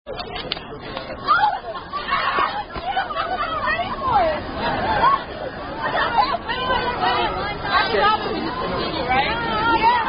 Hand Clapping Game

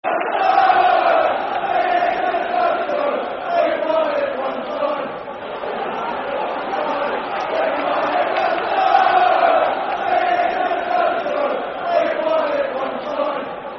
We Won It One Time, Football Chant (Soccer Songs); Fulham Ffc

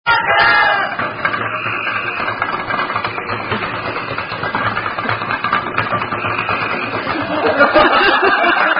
Drinking Game; Thumper

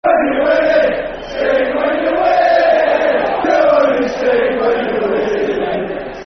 Sing When Yer Winning Football Chant; Swindon Town Stfc

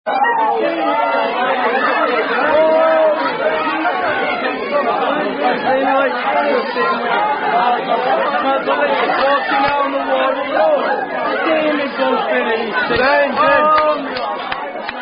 Football Chants

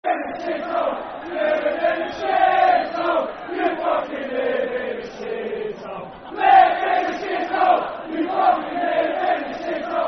Live In A Hole Football Chant (Soccer Song); Milton Keynes Dons Mkdfc

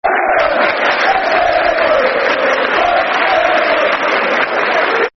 If You Hate Notts Forest, Football Chants; Derby County Dcfc

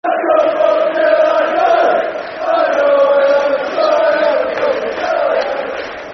Football Chant, Soccer Songs; Scunthorpe Till I Die; Scunthorpe United Scfc

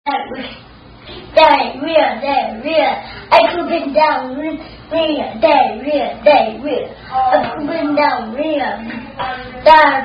Poopin' Diarrhea, Unknown Diarrhea Chant By Child

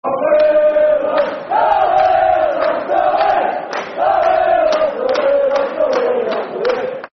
Haway The Lads, Football Chant (Soccer Song); Sunderland; Sunderland Safc

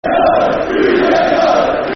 We Hate Cardiff [Football Chant (Soccer Song)]; Swansea City Scfc

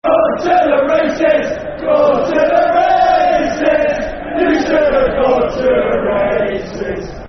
Football Chant; Should Have Gone To The Races; Cheltenham Town Ctfc

